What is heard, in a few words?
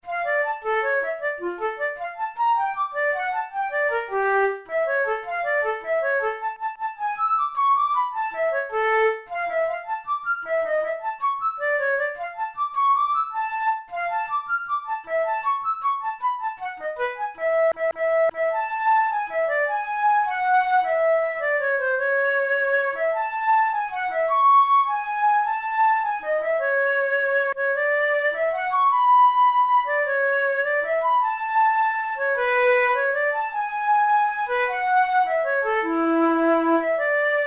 study in D minor; kohler op93 no1 study no3